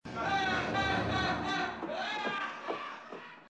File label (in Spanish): risa guason